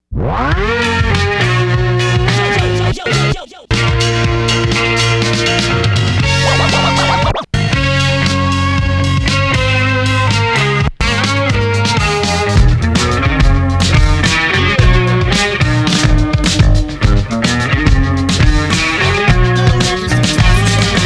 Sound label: instrumental